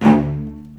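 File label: D1 arco short cello, right; East West; The Ultimate String Collection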